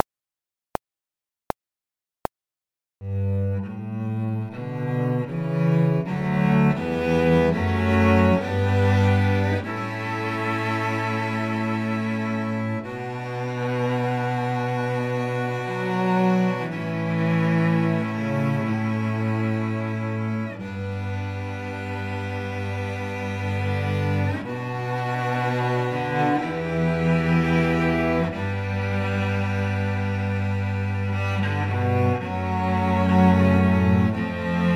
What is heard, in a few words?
Grand Entrance without cello